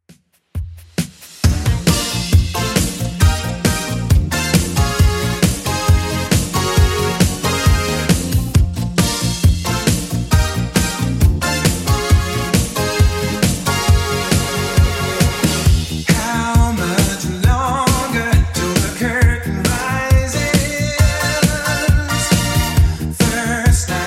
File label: 80s Club Edit 135bpm